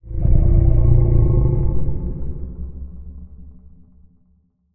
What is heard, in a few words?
Low Roar Distant, Monster Sounds; The Decline Of Warriors; Monster Sounds Volume II